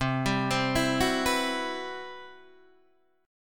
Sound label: C Major Sharp 11th, Guitar Standard; 8,10,10,9,7,7; arpeggiated; capo=7